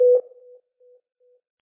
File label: Ambience Interior Hospital